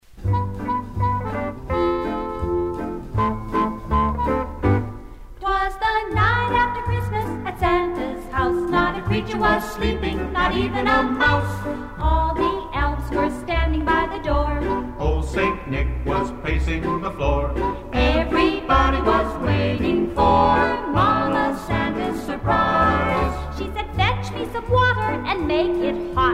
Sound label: Sleigh Ride Jingle Bells